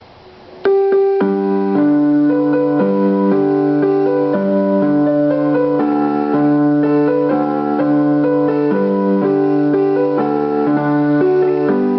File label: piano